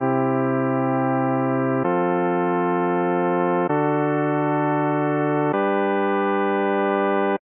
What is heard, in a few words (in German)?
Mod c g dur et; vierstimmig gleichstufig; Modulation; Psychoakustische Grundlagen der Musik